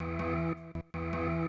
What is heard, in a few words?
Industrial 160